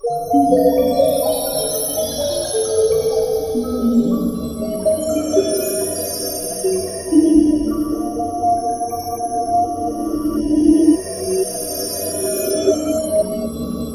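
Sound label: EFFERVESCENT, SCI-FI CHIME; Spectrasonic Distorted Reality 1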